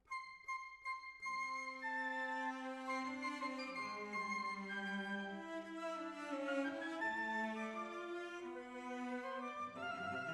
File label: Decca, Jazz for piano